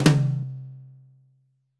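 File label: New Jazz Tom Mid Flam, Roland BK-5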